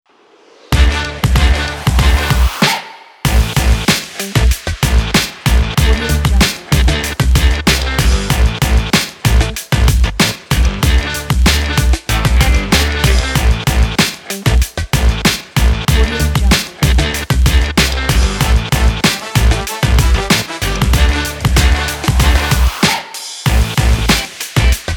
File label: hip hop banger beat